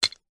food savory snack wasabi pea single drop in ceramic bowl; zapsplat